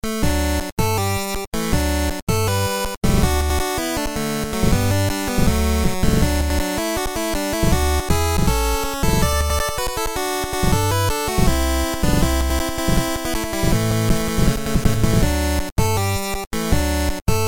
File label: 8bit